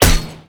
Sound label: Axe Metal, Damage